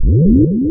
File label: hit shield; ReaderGames; CrazyBall